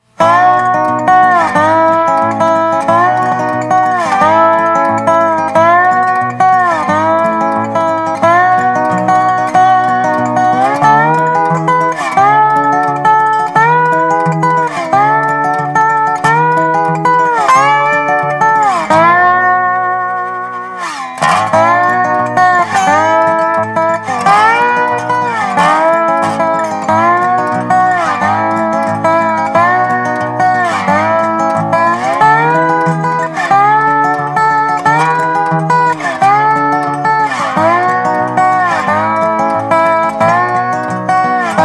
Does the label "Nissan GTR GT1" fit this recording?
No